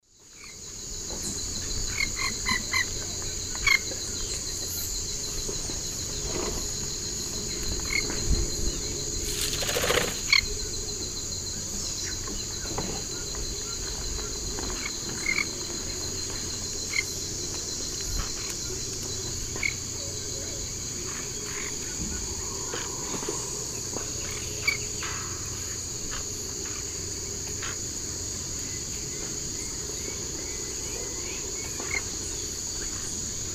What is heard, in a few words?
Aratinga weddellii, feeding calls; S. America; Eastern Lowlands